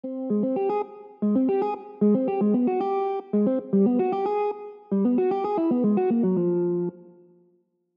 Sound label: motif